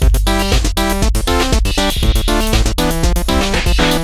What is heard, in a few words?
music; BUG WORLD